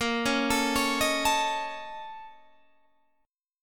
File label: A sharp Minor Major 7th Double Flat 5th, A sharp, down, Guitar, Standard; A#mM7bb5 arpeggiated; JGuitar